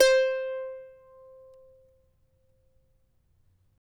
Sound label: CHARANGO